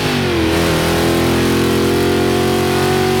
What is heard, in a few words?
third, vehicles cars; shelby gt500kr; lwcars